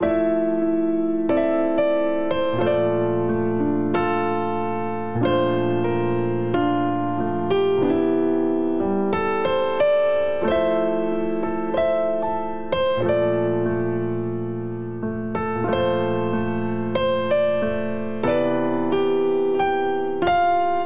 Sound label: Progression Chords & Melody IV ii - vii - I C Major 92 bpm, Grand Piano; MIDI Clips; Ableton; Factory Packs